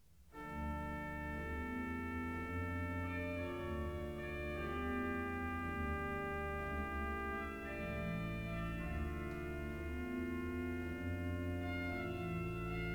Organ Concerto; Handel; Op4-4; HWV292

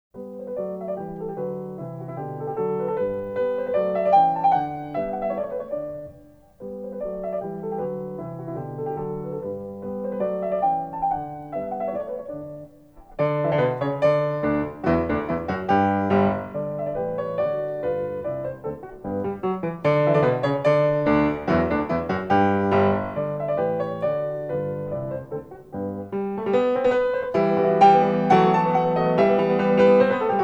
Piano Sonata; Beethoven; No25 Op79

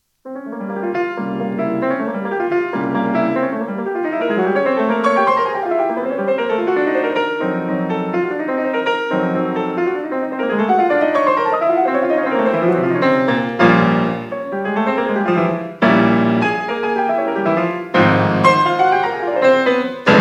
Preludes; Chopin; Op28; Lympany

